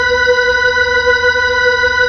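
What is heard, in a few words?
B-3, Hammond B-3 organ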